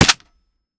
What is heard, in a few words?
fire last mech; weapons; gmod; viper